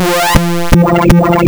PPG wavetables; waveedit256

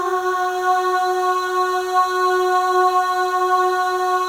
Voices, FEM AHS; Spectrasonics; FEM.1 AHS

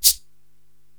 Cabasa Up

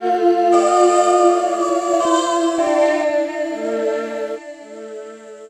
Choral, Vocal; Sonic Foundry (Sony Creative Software); Myths of Technology

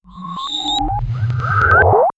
synthfx; StarWars; Over&Out!; X-Static Goldmine

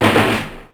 Snare, Ensoniq ASR-X Pro

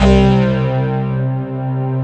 HARDDRIVE C2; Q Up Arts; Dream Experience; SYNTH

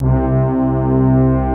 F3 SUB BRASS, BRASS; Greytsounds

